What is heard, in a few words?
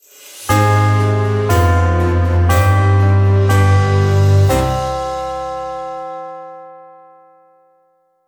Lose Sting; fat princess piece of cake; ps vita